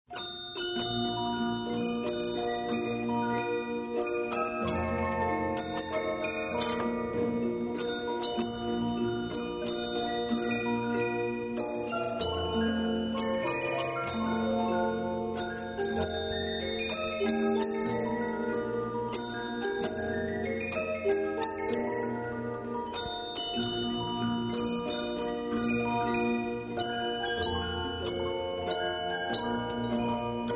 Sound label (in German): originalsound symphonion